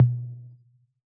analog tom; AnlgKit1

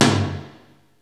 Kawai r-50; Drums

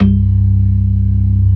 ORGAN SYNTH; InVision Interactive; Keith Emerson

